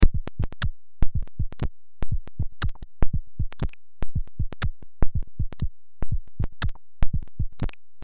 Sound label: fm7 patches